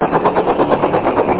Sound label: string shuff; kunstradio